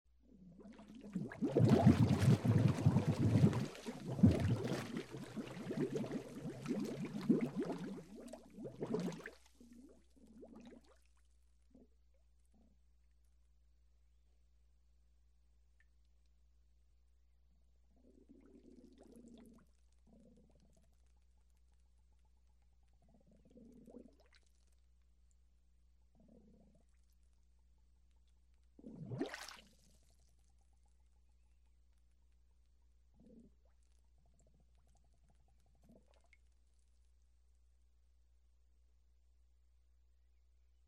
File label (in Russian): zvuk zatoplenija nebolshogo predmeta